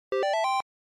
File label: NES Shooter Score